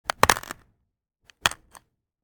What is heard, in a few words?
Stick cracks